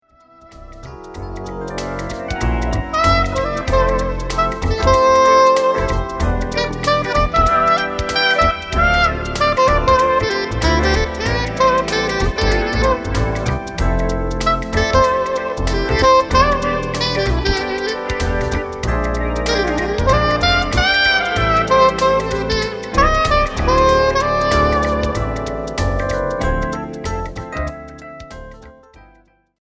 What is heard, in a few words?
sax